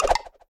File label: miss; HeavenStudioPlus; manzai